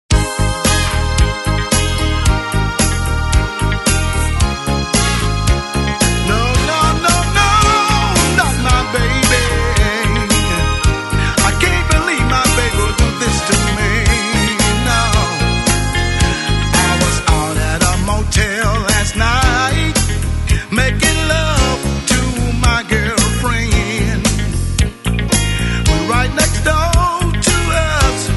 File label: Moans, Grunts and Groans